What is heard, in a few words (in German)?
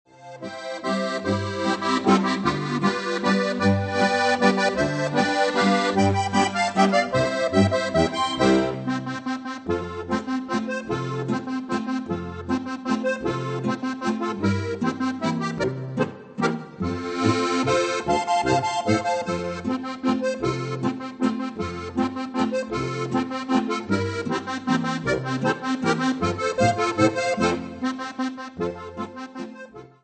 walzer, Harmonikastückl